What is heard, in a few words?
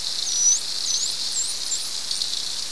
whistle dolphin